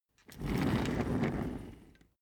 office chair trundle fast long

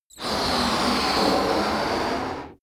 balloon blow long

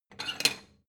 plate small take slide hard

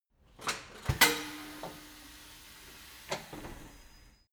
tire changing machine hydraulic arm separator in

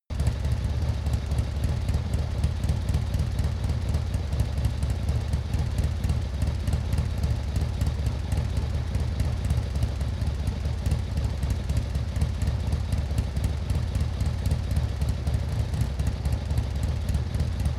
motorcycle harley idle close

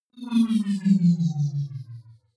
wobble drop hover

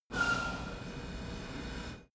train pressure release squeak

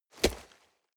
nylon leather hit soft

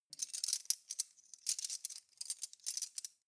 cutlery teaspoons handle long

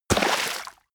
horse step water

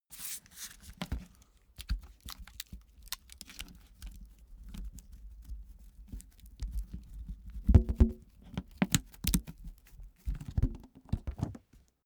balloon handle tie up

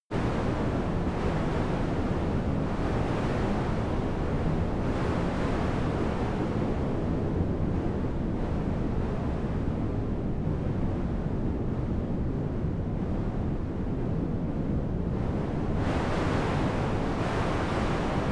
wind soft exterior

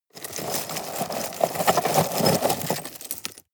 scrape rocks hard

